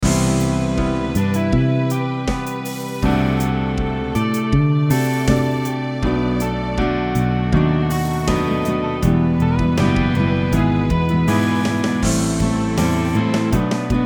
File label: Strings